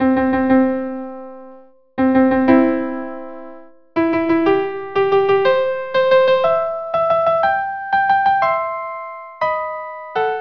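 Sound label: unaccompanied violin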